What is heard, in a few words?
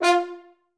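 French horn staccato F1, Advanced Orchestra; Best Service; Peter Siedlaczek's Advanced Orchestra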